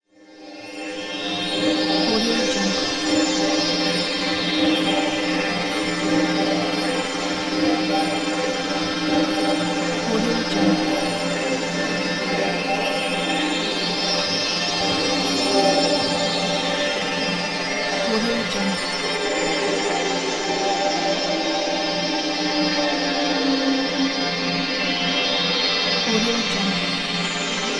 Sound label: atmospheric pulsing drone; flytrix